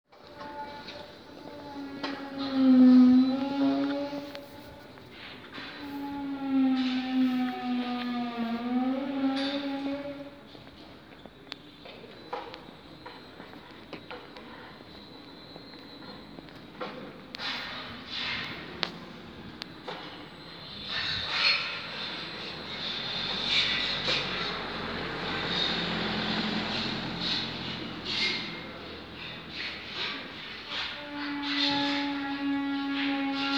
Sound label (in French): sons du vaisseau; Maxtor